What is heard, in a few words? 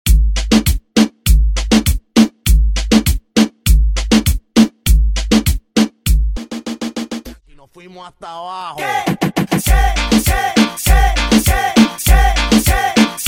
intro outro party 100 BPM